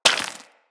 Bullet Impact Debris Rock

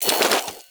Igniter Pistol Reload, Weapons; Warframe; Corpus

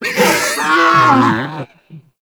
Snow Critter Vocal Death; Hunting Critters

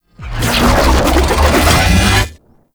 Medic Aoe Wave Cast; Abilities; Warframe; Tau; Dax Medic

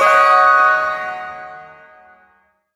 Ersatz Shawzin Yo Chord L; Warframe; Duviri

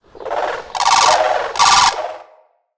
Amb Corrupted Bird Close; Ambience; Mendicant Area

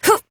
Jump Adult Operator; Warframe; New War

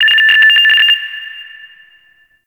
Interior Orbital Station Telemetry High

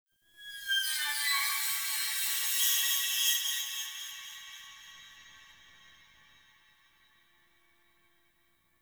Sentient Lair Texture Interior Small, Ambience

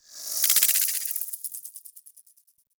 Insect Close; Ambience; Warframe; Eidolon Plains

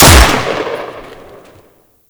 Shot, Lever Action Pistol; Warframe; Grineer

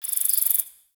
Cricket; Warframe; The New War; Ambience; Zariman

